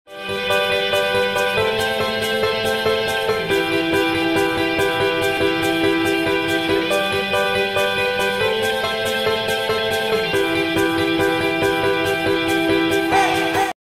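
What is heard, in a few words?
background music ringtone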